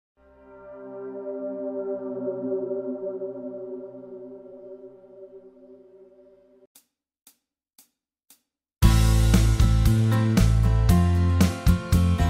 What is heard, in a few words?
Guitar Backing Track